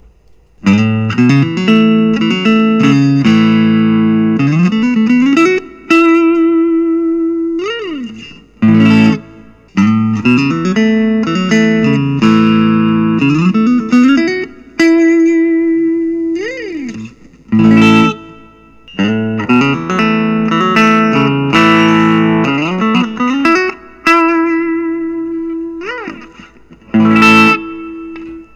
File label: anderson top t hollow koa